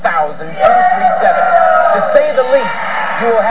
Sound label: audience